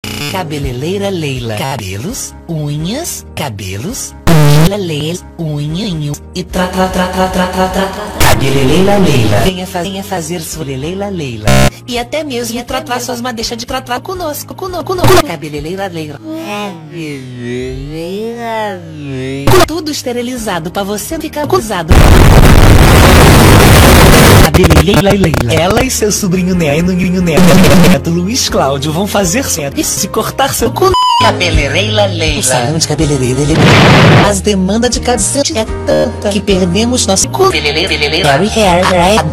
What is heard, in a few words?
growl, enderdragon; LumiCu 2.0; minecraft